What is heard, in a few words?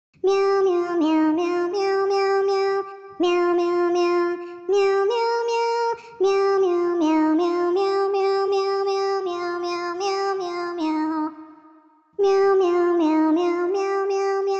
Mary Little Lamb Voc; purr pals 2007 nds